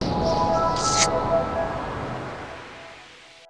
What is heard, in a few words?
taunt kiss; windrunner